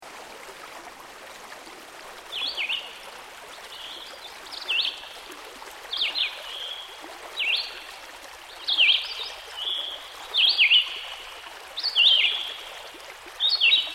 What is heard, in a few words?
Red-Eyed Vireo; Dan Gibson; Songbirds By The Stream